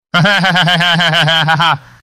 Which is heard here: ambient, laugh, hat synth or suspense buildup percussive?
laugh